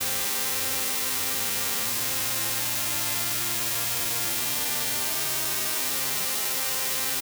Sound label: full strings AM white